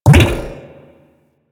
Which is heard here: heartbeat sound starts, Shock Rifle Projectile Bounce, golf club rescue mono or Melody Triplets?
Shock Rifle Projectile Bounce